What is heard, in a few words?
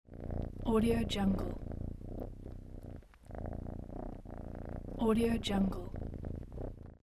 cat purring